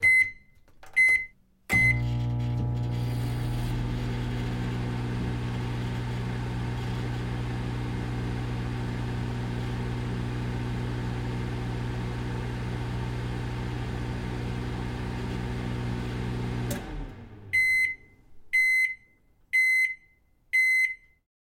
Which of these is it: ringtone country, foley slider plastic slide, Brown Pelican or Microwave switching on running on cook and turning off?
Microwave switching on running on cook and turning off